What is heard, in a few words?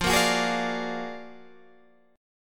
Gb Augmented Major 9th, Guitar